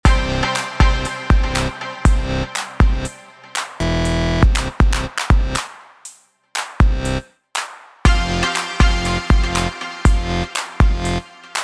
loop

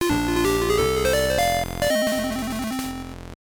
Bonus Win; Donkey Kong Land 3; GB

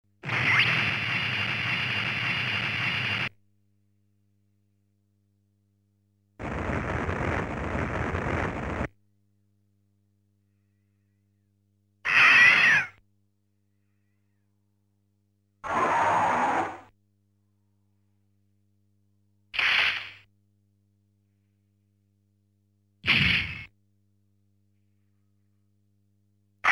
Background SE; Great Magic Strategy; Mahou Daisakusen; Sorcerer Striker

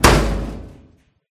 metal door hatch close slam; MONO; ProSoundCollection v1 3; Doors

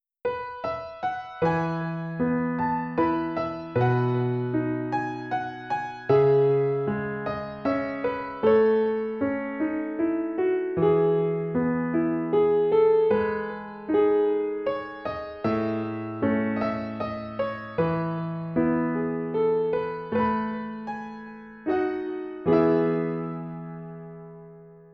Piano Solo; stardew valley

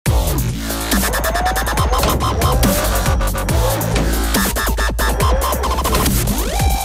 Dubstep Idle

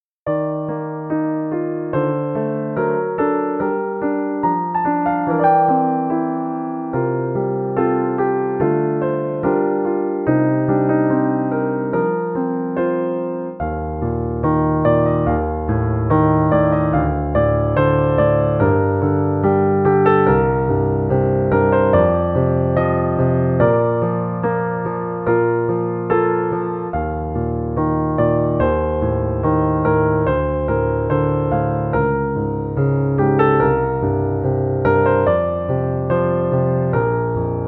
Piano Only

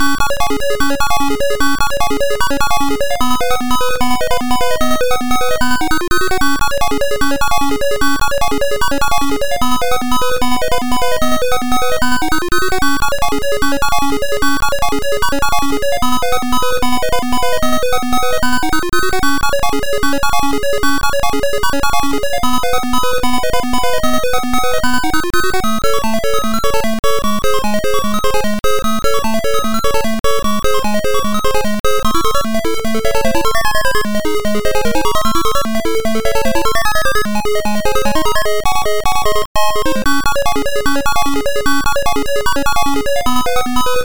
Full Power-Up; Star Soldier; msx

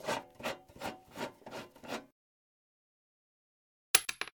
Screw Driver

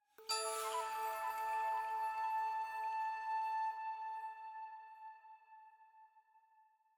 item Jingle Flute + Chime A

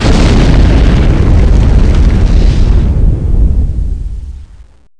mission lose; Vaccine

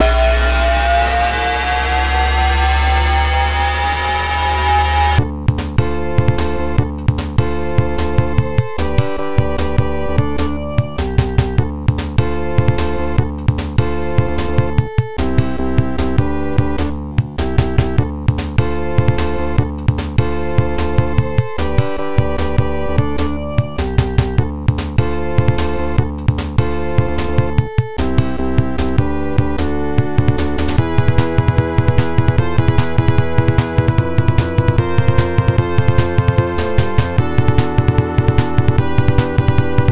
Vehicle (with Airplane SFX)

